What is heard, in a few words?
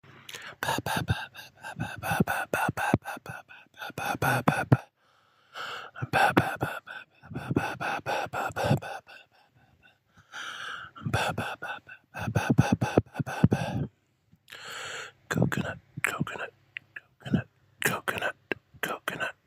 Asmr test